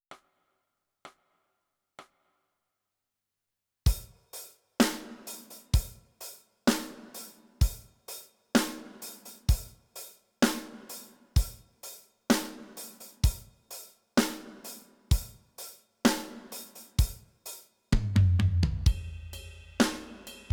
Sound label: Drums